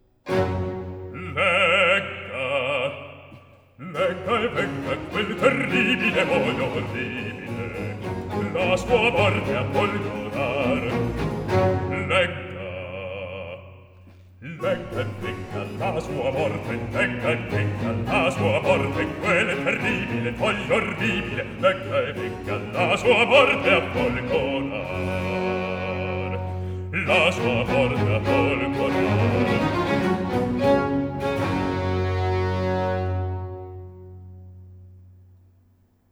Arioso; Atto 2 - Scena 16; Tito; Legga, legga, e vegga; Antonio Vivaldi; Tito Manlio (2005)

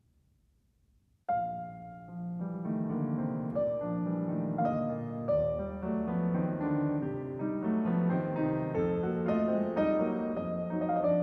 Preludio in si bemolle maggiore, piano; Chopin - Piano Works - Vladimir Ashkenazy

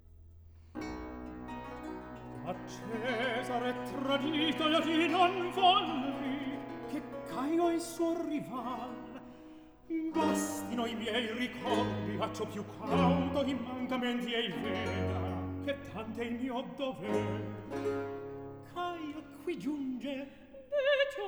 Recitativo; Atto II Scena 2; Decio, Caio; Antonio Vivaldi (1678 - 1741); Vivaldi - Ottone in villa (2010)

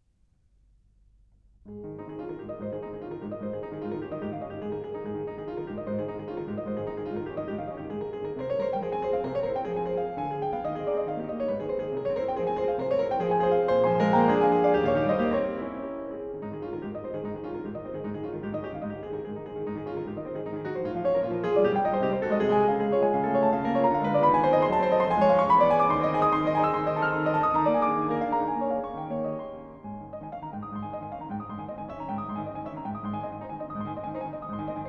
Preludio in la bemolle maggiore, Piano; Chopin - Piano Works - Vladimir Ashkenazy

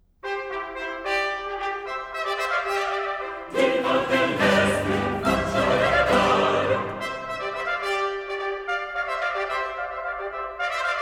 Tutti (Coro); Diva dell' Espero; Antonio Vivaldi (1678 - 1741); Vivaldi - Orlando furioso (2004); Atto II

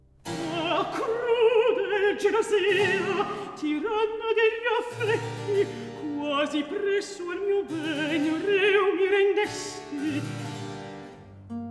Recitativo; Atto I; Scena 8; (Orlando); Antonio Vivaldi (1678 - 1741); Vivaldi - Orlando furioso (2004)

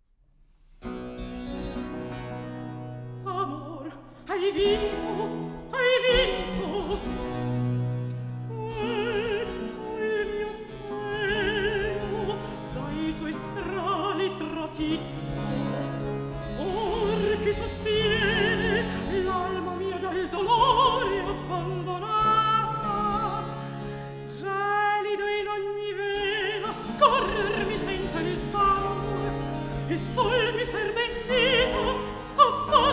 Cantata, Recitativo; Cantata, RV 683 'Amor, hai vinto'- I. Recitativo; Antonio Vivaldi (1678 - 1741); Vivaldi - Concerti e cantate da camera III (2005)

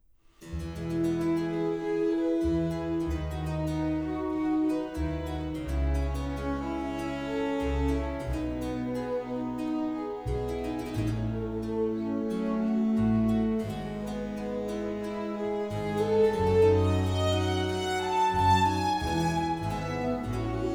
frammento strumentale; Antonio Vivaldi (1678 - 1741); Vivaldi - Arie per tenore (2010); Fido amante; (L'incoronazione di Dario)

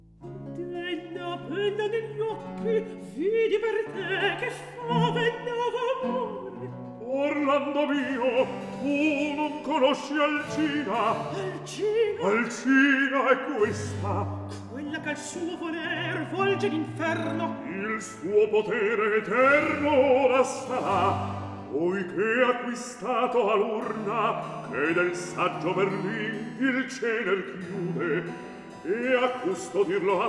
Recitativo; Atto I; Scena 3; Orlando, Astolfo; Antonio Vivaldi (1678 - 1741); Vivaldi - Orlando furioso (2004)

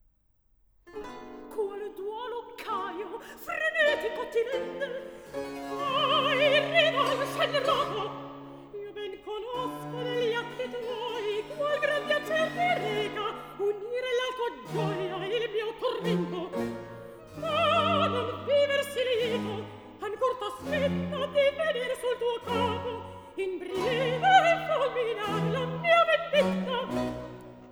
Recitativo; Atto II Scena 4; Tullia, Caio; Antonio Vivaldi; Ottone in villa (2010)

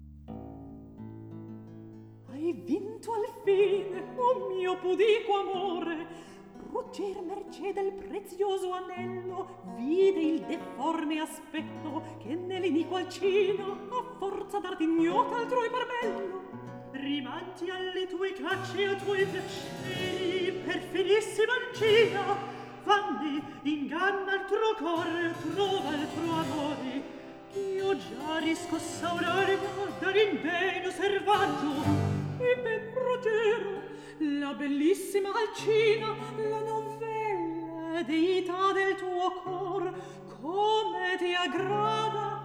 Recitativo; Bradamante, Ruggiero; Antonio Vivaldi (1678 - 1741); Vivaldi - Orlando furioso (2004); Atto II

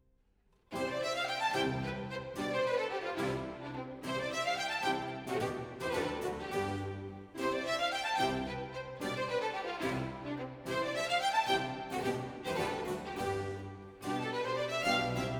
Sinfonia - Allegro molto; Antonio Vivaldi (1678 - 1741); Vivaldi - Armida (2010)

